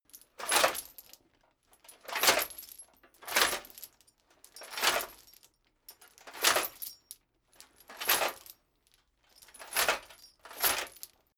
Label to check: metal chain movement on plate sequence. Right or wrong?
Right